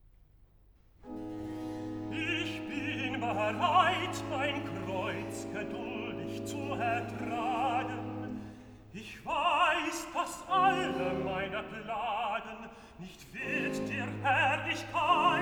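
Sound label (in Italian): Recitativo (Tenor); Ich Bin Bereit; Bach; Cantatas; BWV 146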